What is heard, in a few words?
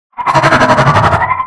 Spider Grunt General Scream; Deep Rock Galactic Boardgame